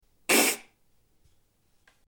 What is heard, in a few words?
sudden fart in bathroom